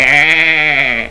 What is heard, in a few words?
sheepish